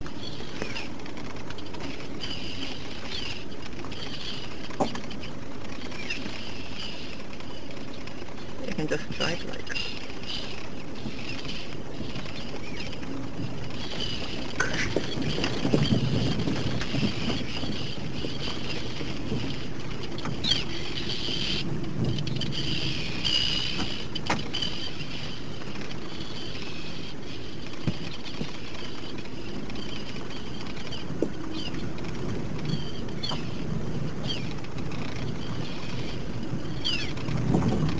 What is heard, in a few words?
birds; iceland